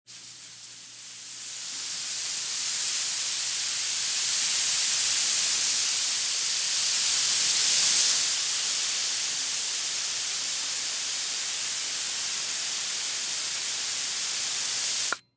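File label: Increasing Air Release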